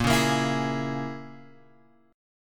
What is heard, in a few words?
Bb Minor 7th Flat 5th, Guitar, Open G; 8,6,8,9,9,x